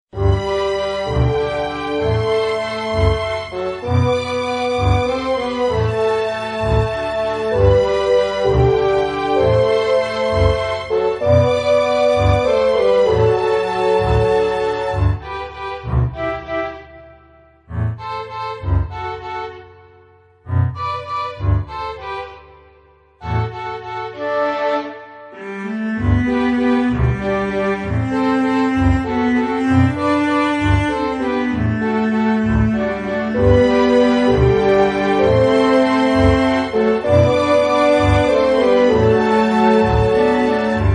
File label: Waltz Ringtone